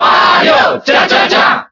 Cheer Spanish; Wario; SSBB

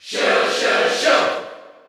Cheer Dutch; Shulk; SSBU

